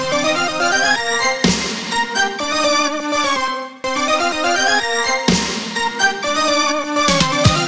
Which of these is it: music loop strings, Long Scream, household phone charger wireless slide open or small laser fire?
music loop strings